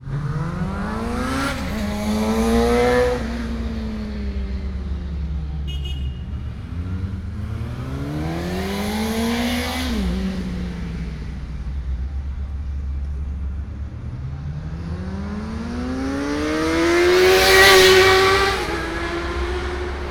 bikes; krasnoyarmiiave; sergiyevposad

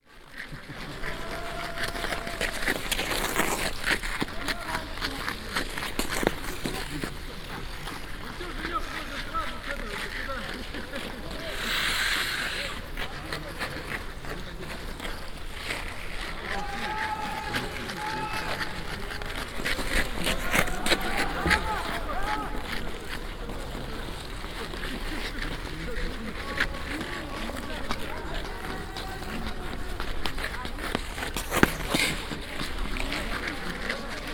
skates; peresvet

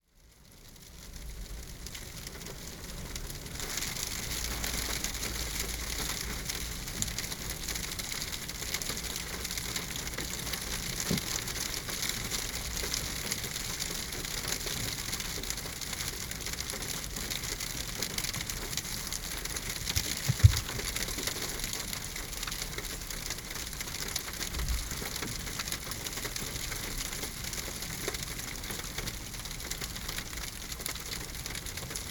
raindrops outside the car; sergiyev posad

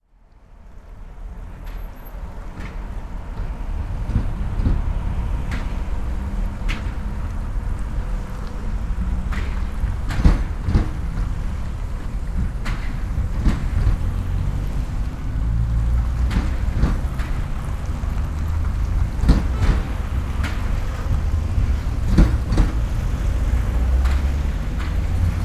railway crossing, sergiyev posad; vifansky

